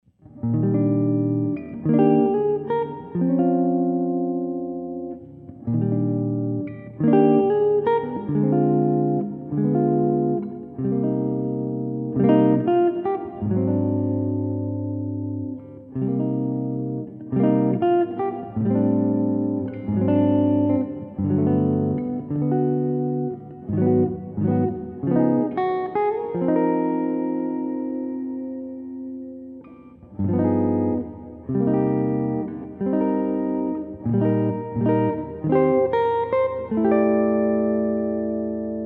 jazz tunes

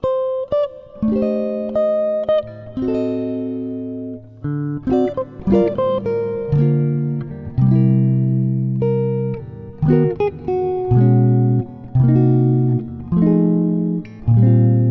Chord Melody; jazz tunes

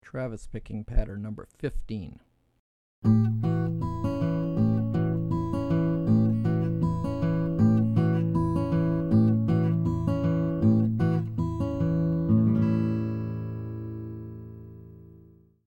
Travis Patterns; travis style lesson materials

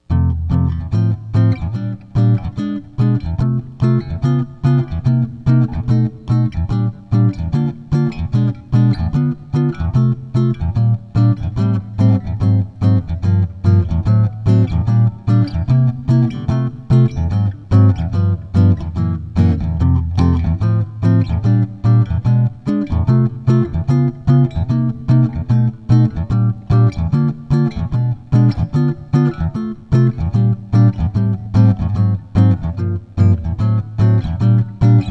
Chorus, jazz tunes

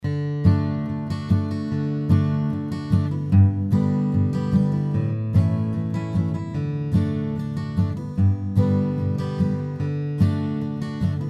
strum style tunes

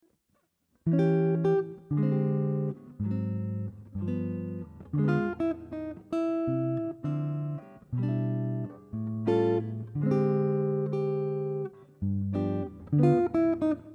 Chord Melody; jazz tunes

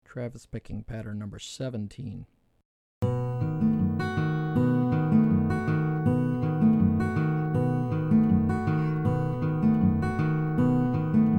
Travis Patterns; travis style lesson materials

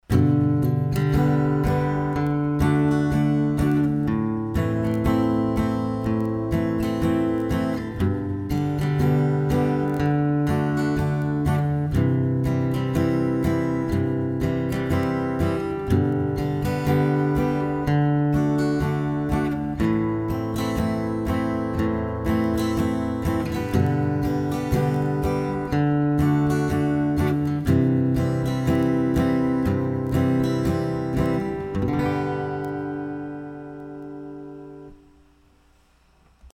strum style; strum style tunes

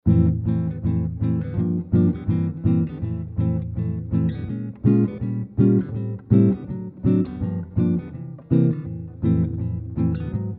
Basic Comp, jazz tunes

